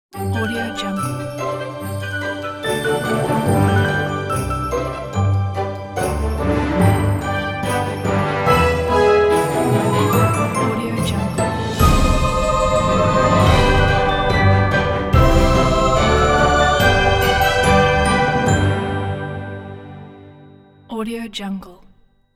light holiday fun

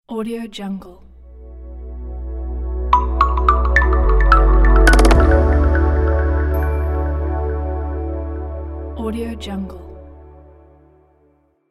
logo inspire

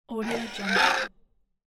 automatic dispenser

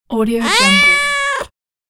cat meow

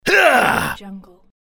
male roar argh

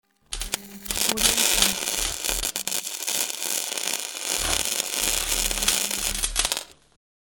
electric welding

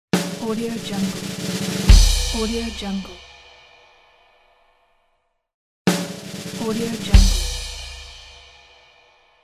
drums roll

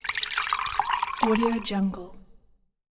water pour

